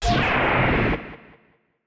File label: enemy main attack; chinthe; GameJamDungeon